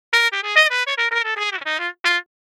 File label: straight mute, trumpet; samplemodeling